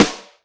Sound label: Rimshot Snare, Drum Machines Roland R-5